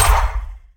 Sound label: imp finger magic